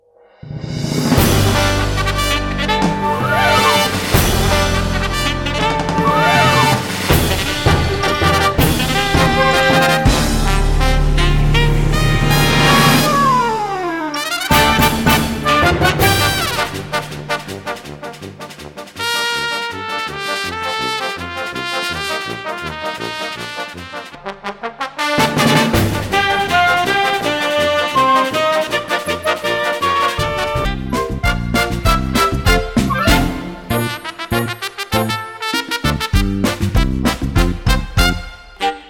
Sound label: kids hit melody